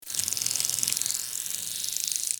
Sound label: Insect little monster flying wings